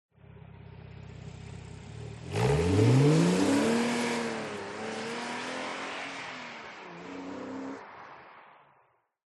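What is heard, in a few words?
SPORTS CAR Exterior Drive Away Fast; TRANSPORTATION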